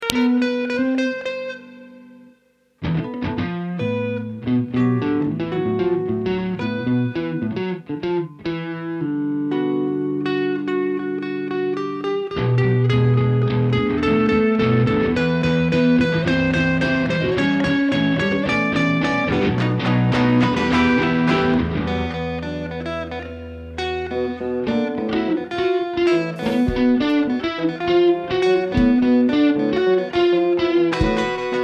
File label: Ambient Guitar Mix